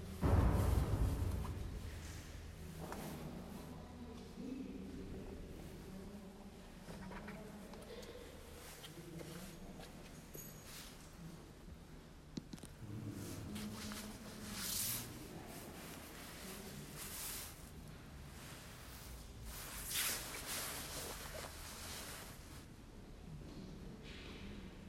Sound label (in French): les cloches intérieur